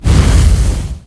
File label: Fire Ball Hit; god awe full clicker 2018 gamerip